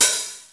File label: TR909 OP HH; East West - Global Sounds